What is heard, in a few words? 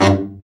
BRA BARI, Bariton Sax